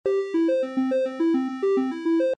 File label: reel run; games; poker; American Poker The Legends II